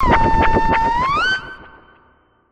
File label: cry